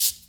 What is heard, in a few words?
Shaker; Equipped Music; Loungin House